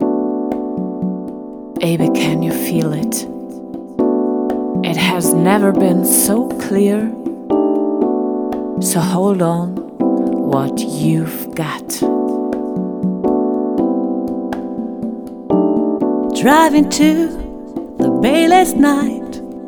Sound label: vocal; Vocal Attack 4